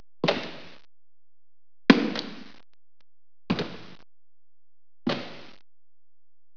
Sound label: boots